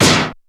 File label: ZIP